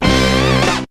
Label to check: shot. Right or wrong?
Right